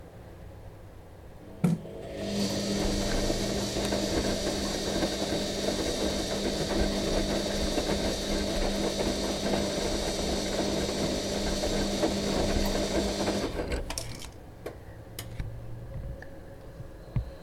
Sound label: indesit washing machine, an apartment placed washing machine